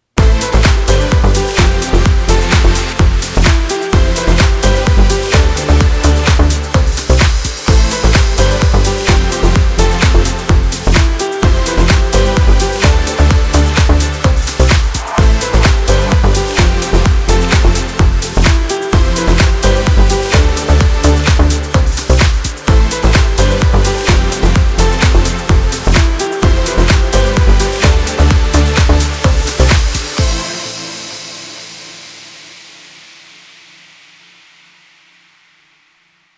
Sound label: house electronic techno; By Burak Sound Bank; house electronic techno vol 4